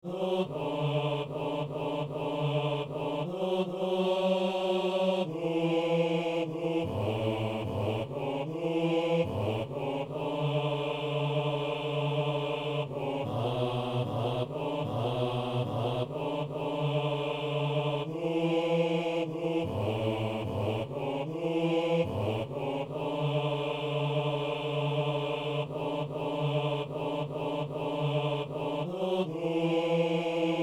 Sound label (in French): hommes